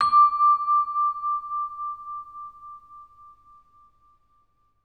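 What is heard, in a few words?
VB VISL D5, vibraphone; Best Service; Peter Siedlaczek's Advanced Orchestra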